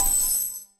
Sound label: heal impact